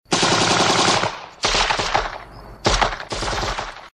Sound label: machine gun fires